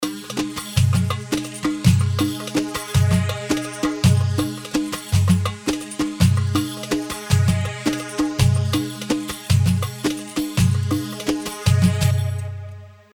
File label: Maghribi 3/4 165; مغربي